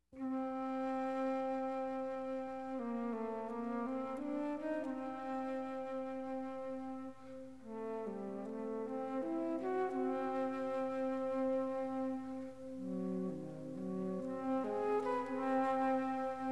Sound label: Big Band, Modal